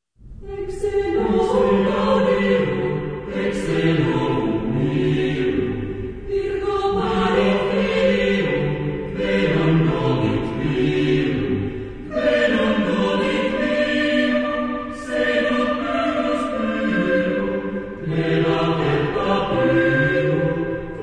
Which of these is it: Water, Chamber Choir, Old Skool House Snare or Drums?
Chamber Choir